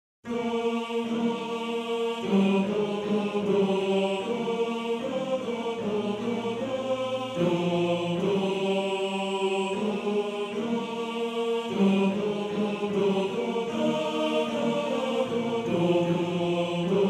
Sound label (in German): Bass; Bass 1